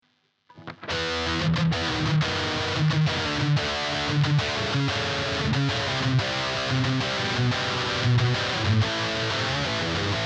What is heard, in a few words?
Katana Metal Zone